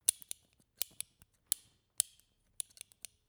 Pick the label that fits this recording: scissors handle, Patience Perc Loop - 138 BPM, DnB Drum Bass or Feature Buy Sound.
scissors handle